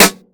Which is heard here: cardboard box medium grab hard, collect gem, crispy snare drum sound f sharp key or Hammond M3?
crispy snare drum sound f sharp key